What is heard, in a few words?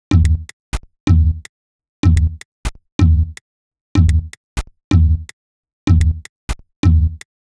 Tb Fx Loop 125; Equipped Music; Loungin House; Perc Loops 125